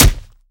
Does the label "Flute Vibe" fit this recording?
No